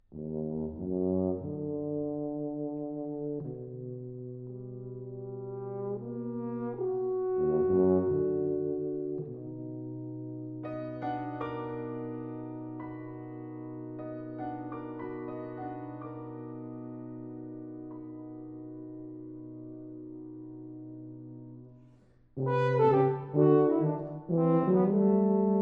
Divertimento For Horn, Tuba And Piano, Prelude and Ritual Dance